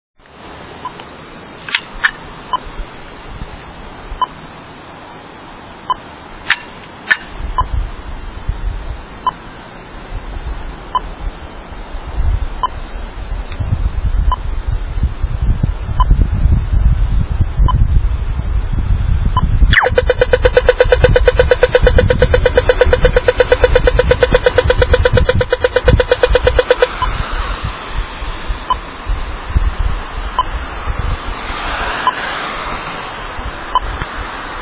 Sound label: signal